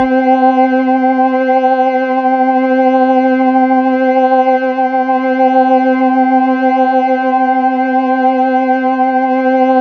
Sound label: C4, Synth Line; 90 s Sample CDs; NiceBeats; SynthLine VOL-27